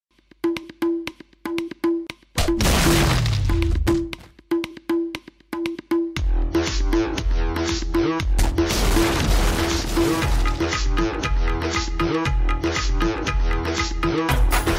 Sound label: Indian Music